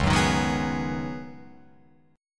Db 9th Suspended 4th Db down Guitar Open F; strummed; JGuitar

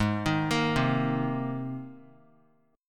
G sharp Major Add 9th, chord; G#add9 arpeggiated; JGuitar

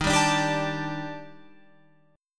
Eb Major Sharp 11th Eb Guitar Open F; EbM#11; strummed

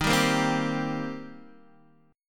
D sharp 6th, D sharp, down, Guitar, DADGAD

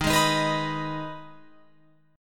Eb Suspended 4th Sharp 5th Eb Guitar Open F; Ebsus4#5; strummed

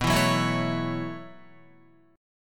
B 9th Suspended 4th down Guitar 4F,4A,5C,5G,5C,6E; B9sus4; strummed; JGuitar

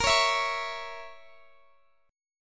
A sharp Diminished Guitar Open F, chord; A#dim; strummed; x,x,22,20,19,20

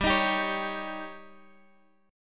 Db Suspended 2nd Flat 5th

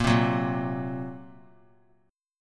Bb Suspended 2nd Sharp 5th; Bbsus2#5; strummed; 22,19,21,20; JGuitar

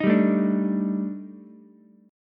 Eb Major 7th Flat 5th, Ukulele; EbM7b5; strummed; JGuitar

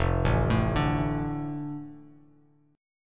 F 6th F Bass Standard; F6 arpeggiated

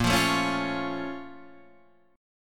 Bb Minor Major 7th, chord; BbmM7; strummed; 6,8,7,6,6,9; JGuitar

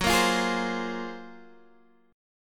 Gb Major Sharp 11th Gb Guitar Standard; strummed; x,9,8,10,9,8; JGuitar

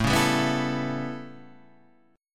A 9th, down

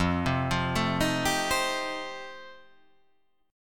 F 9th F, chord

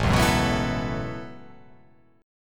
A 6th Add 9th, A6add9 chord; strummed

